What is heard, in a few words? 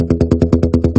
drumbone